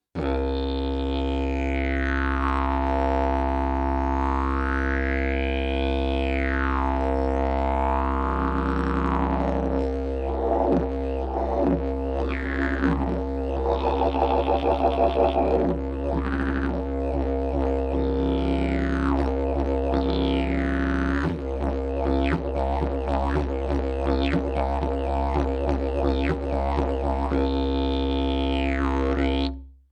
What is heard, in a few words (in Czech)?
jilm, didgeridoo